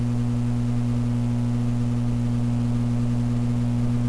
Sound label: fluorescent